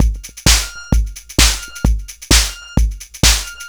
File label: Beat (130BPM), techno; techno drum fx